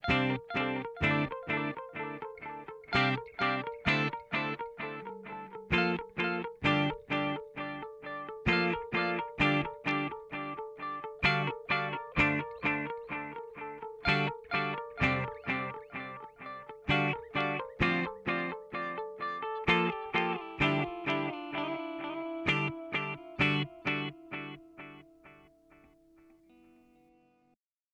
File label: Tape Delay; Boss DD8